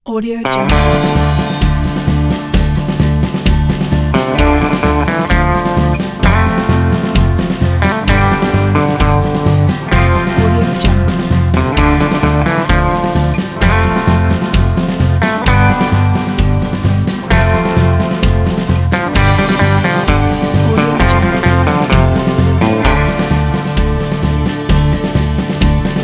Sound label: spaghetti western